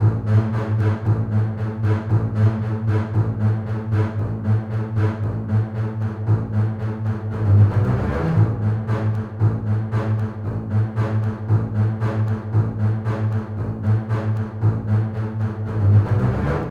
bass spiccato, средневековая мелодия; средневековая мелодия для саундтрек

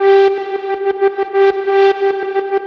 One Note

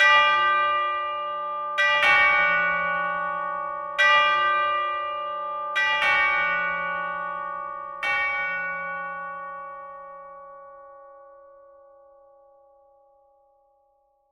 Chimes

